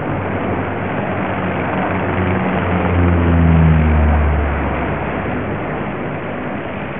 planes bg

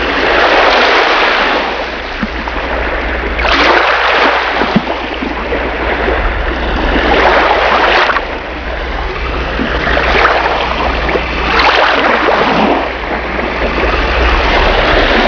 sea waves

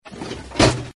plank down; angelsNdogs; dungeon1

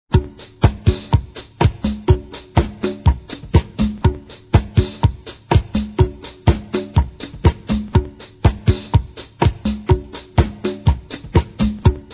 drums

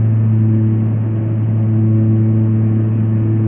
plane steady

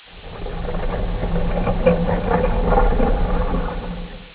door

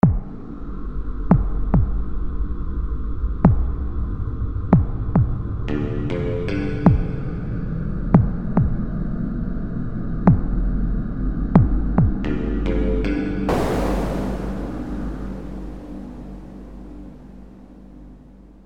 Ambient Start; Lair

